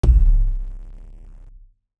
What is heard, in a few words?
Shark Caught